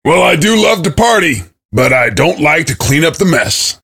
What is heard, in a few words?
cheer; svencoop; voice commands; SeriousSam